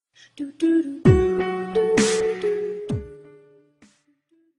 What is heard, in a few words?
dubstep remix